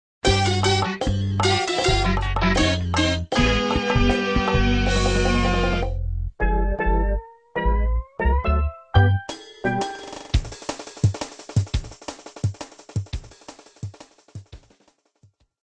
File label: The Game Over music